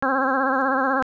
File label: 16hz vibe